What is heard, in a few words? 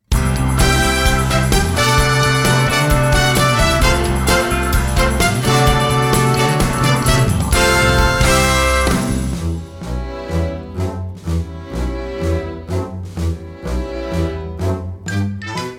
instrumental; Shrek Jr